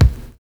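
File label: noizy bass drum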